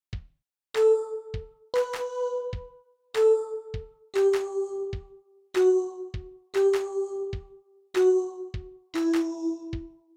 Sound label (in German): Melodie Begleitstimme